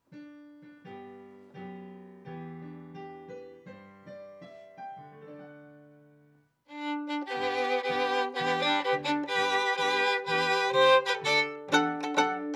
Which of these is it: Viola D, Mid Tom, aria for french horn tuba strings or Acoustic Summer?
Viola D